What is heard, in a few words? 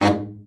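baritone, BARITON SAX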